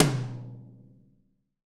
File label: TOM, Real-Kit; 90 s Sample CDs; Sound & Vision - Gigapack I CD 1 (Roland)